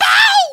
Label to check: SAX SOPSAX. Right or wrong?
Wrong